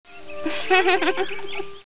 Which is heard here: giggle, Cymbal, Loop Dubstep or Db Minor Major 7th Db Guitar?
giggle